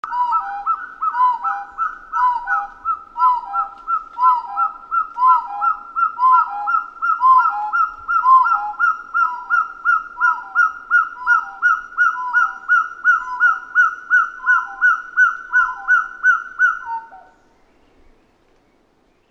red-breasted hill partridge

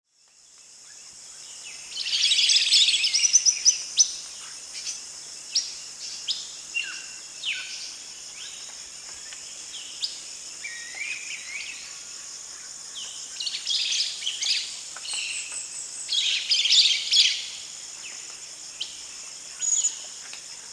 dusky broadbill

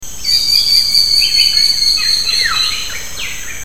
maroon woodpecker

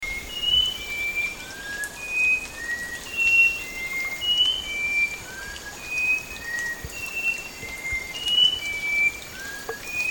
sooty-capped babbler

